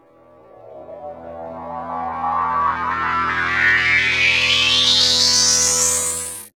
Unsteady Swell, Underground UK House & Electro, Pads; Sonic Foundry (Sony Creative Software)